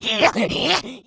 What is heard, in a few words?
rage, infected, female